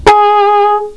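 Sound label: FLUGELHORN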